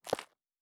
Dirt Walk Surface, Footsteps

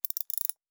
Lockpicking; Survival Game Sounds - Lite Edition; Doors

